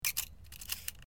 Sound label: Camera Clicks Wind; shutters & movement